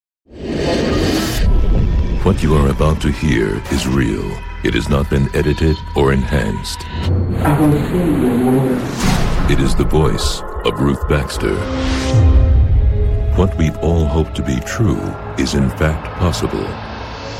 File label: whitenoise